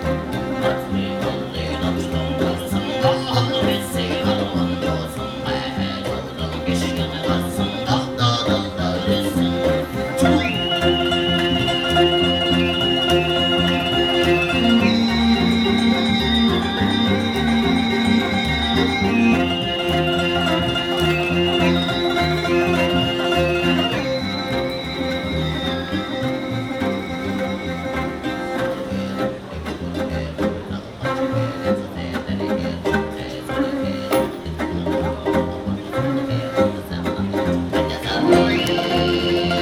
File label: russia mongolei; best service Blue Box